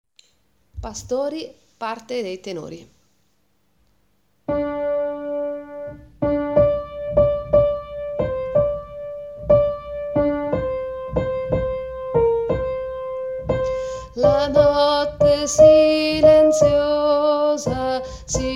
pastori tenori

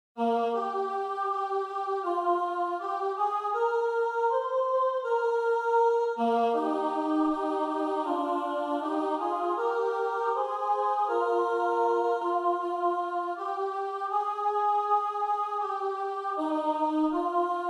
soprani contralti

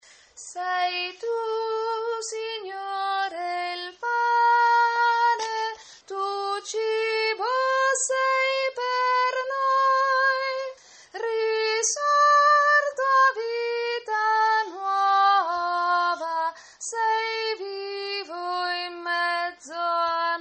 soprani

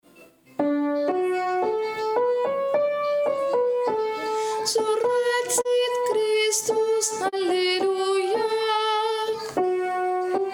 surrexit christus soprani

